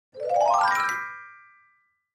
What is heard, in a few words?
Clavichord Ascendin; keyboards